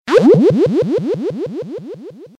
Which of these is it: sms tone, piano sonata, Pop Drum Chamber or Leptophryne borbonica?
sms tone